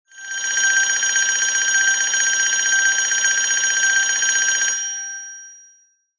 Metal, tono de llamada; Tonos de llamada

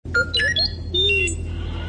R2D2; Star wars; Notificaciones

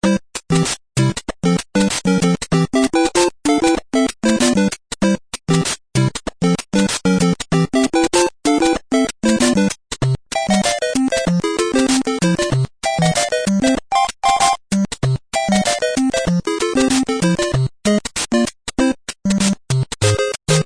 8 Bit; Super Mario

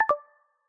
Video Stop; lolipop; Notificaciones

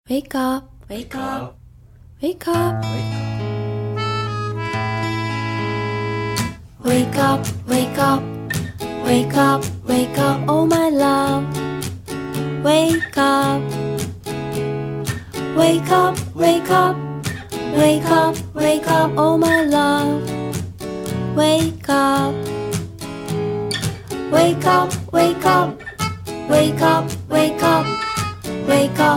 Kor Wake Up, alarmas ringtones

